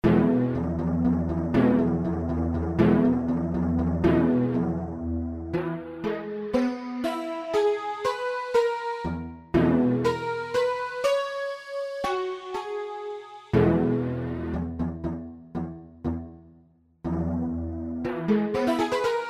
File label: shakuhachi